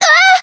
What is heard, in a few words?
Voice melody damaged